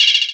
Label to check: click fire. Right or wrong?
Wrong